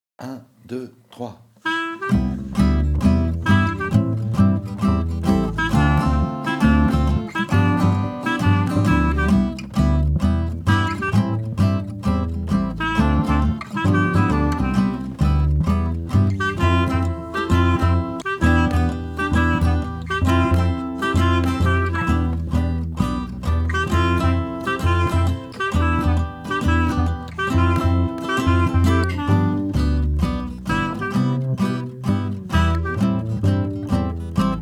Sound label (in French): INSTRU